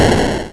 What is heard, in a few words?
explosion